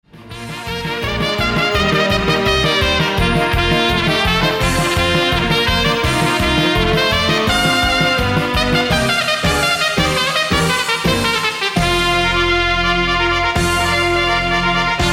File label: trumpet; Impressionist